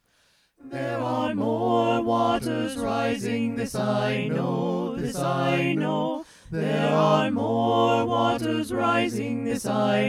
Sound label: SATB; full SATB recordings